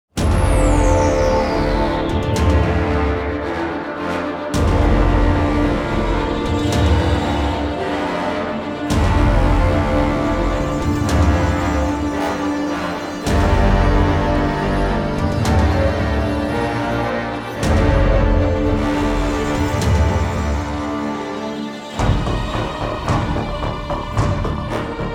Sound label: Suspense; anno 2205 gamerip 2015; Redemption